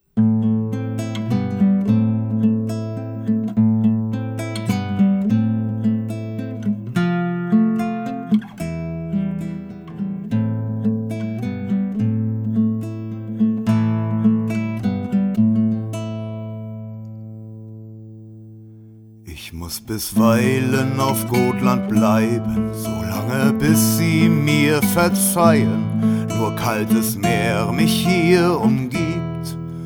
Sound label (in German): Ballade